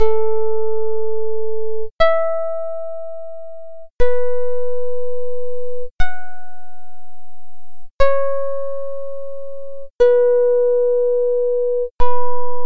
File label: tuning quarter comma meantone